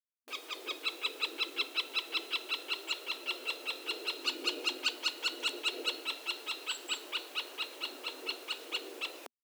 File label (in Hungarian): közép fakopáncs Dendrocoptes medius, madarhangok